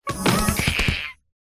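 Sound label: Pet Monkey buff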